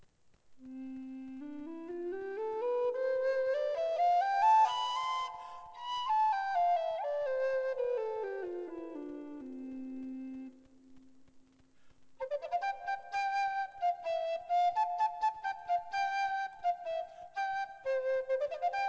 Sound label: tenor, flute baroque